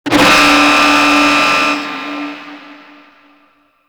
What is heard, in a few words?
Mech Shocking Iron Warning; Warframe; Nechro Mech; Abilities